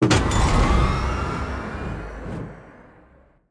Rotisserie Speed Up; Scraprun; Deathrun

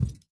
Hand Paddle Attack Extra Light

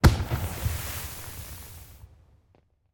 Celebration Fireworks

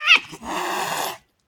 Angry and Hiss, Wearables; BASTARDO

